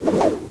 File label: shot spin; weapons; ayakashi